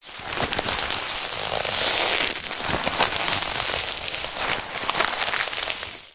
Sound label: foley paper wrapping ball squeeze creak